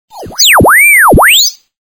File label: radio tuner